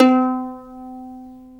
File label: MANDOLIN B3; AKAI S-Series CD-ROM Sound Library VOL-7